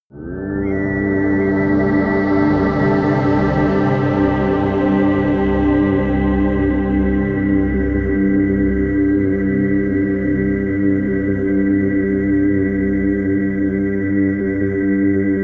drone great wall

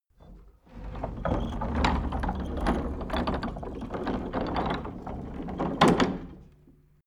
wooden rolling gate; tueren

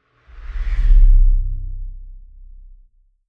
sci-fi vehicle drive by metal soft; raumschiff

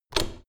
door plastic handle pull up hard

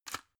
drug blister put in smooth; berufe; arzt

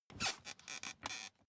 office chair creak; objekte; stuhl

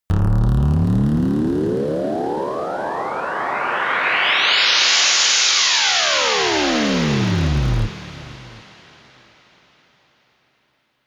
creaking noise rise fall, Sweeps und Swells; comedy-cartoon; soundpool

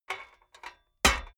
metal clad plate hit; industrie-wirtschaft

